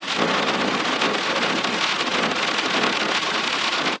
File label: rain